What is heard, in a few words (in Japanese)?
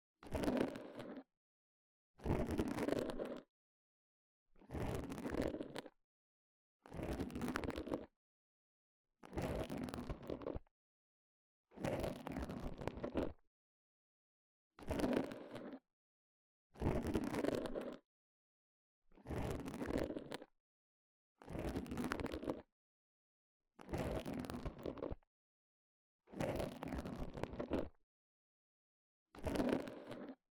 耳かき音; 積極的なギャルっ娘とイチャあまた～っぷりマッサージ&耳かき